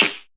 clap single hit a key; percussion; single hits one shots

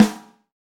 2000s boom snare one shot a key

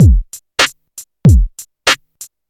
96 bpm 00s electronic drum loop sample g key

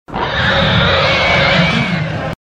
squealing and roaring wild

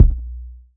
rich low end bass drum sample b key

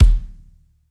reggae kick drum single shot e key

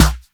smooth snare b key; snare drum samples

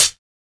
raw closed high hat sample C# key; modern drum samples; C sharp

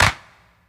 subtle reverb clap A key; percussion

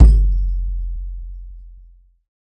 low tom drum f sharp key; tom drum samples; one shots

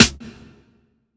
short reverb acoustic snare sound a sharp key

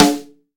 Present Acoustic Snare D Key

